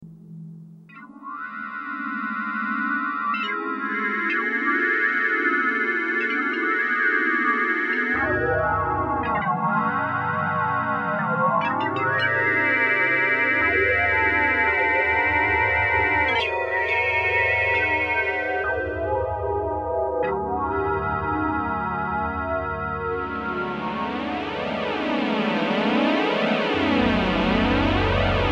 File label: pads